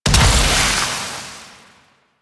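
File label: Invisibility Projectile Explosion; Warframe; Quests; The New War; Drifter; Abilities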